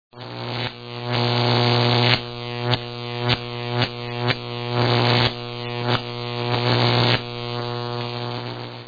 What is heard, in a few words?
zap